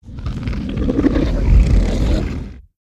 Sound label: Monster Combine, monsters